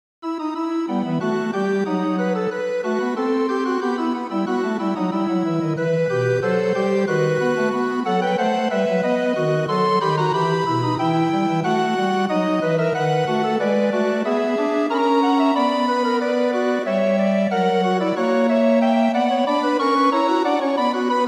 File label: piece organ; to bruteforce out of writers block with this piece